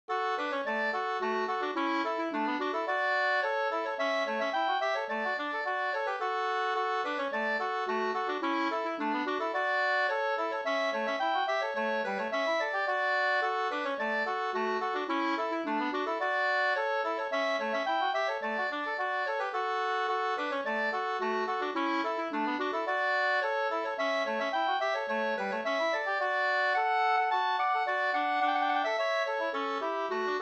slangpolska

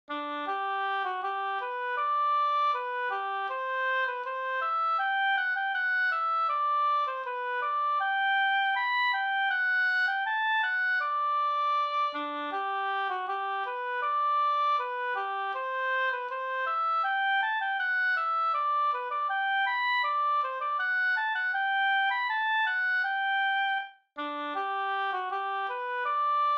brudmarsch

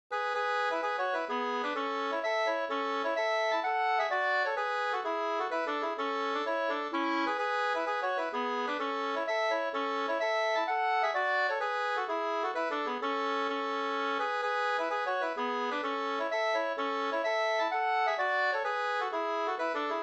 polska Trallpolska

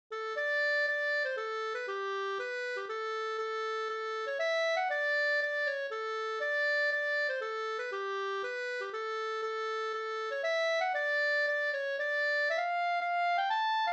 polska kringellek; Gagnef

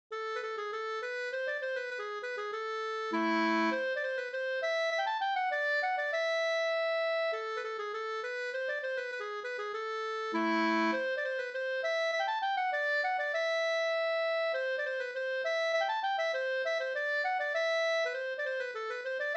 polska Bingsjopolska; e Myr Anders Persson

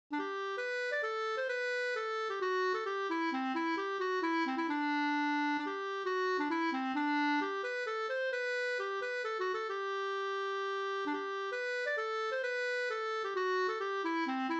polska kringellek; Gagnef

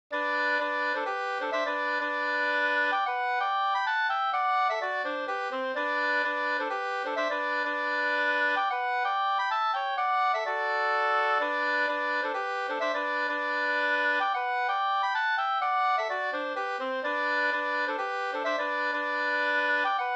polska, 2st; e J Eklund Jumkil Up

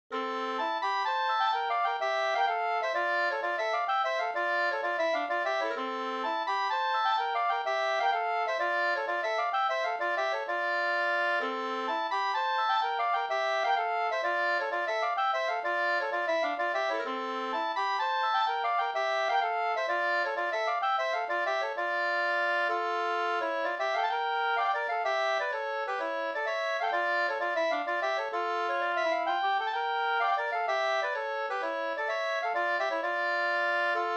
polska springlek

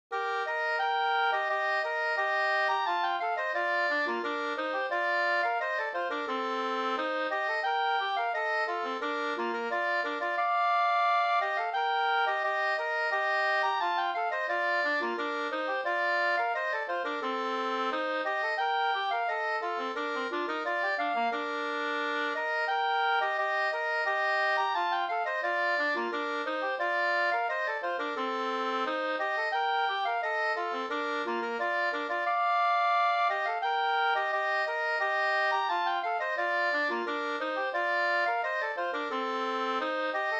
ganglat; Marsch e August Frank Up

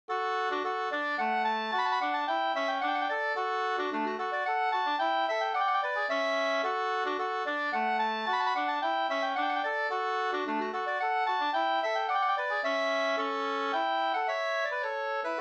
polska Bingsjopolska; Blarocken e Pekkos Helmer 2st

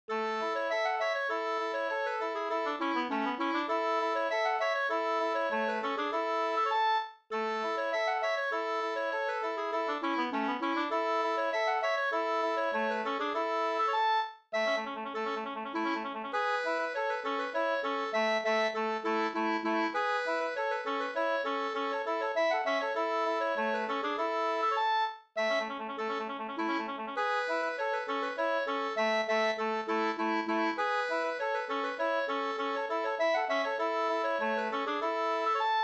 slangpolska

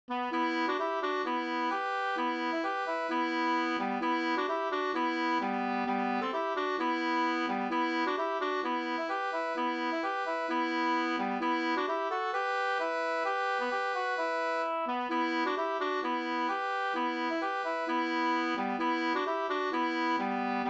schottis; e Jonas Bystrom Sjalevad An 2st

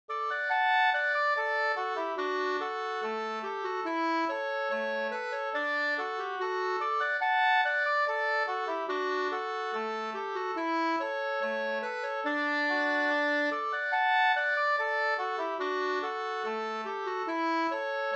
skanklat

